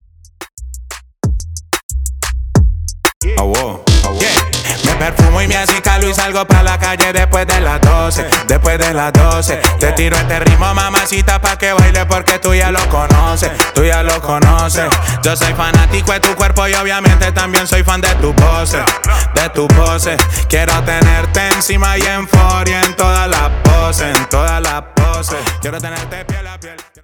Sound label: Intro Chorus 91 Bpm